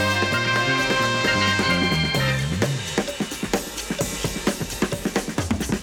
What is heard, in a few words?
apollo break, breakcore